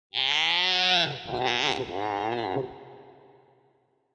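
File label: pterosaur, flying dinosaurs; pterozavr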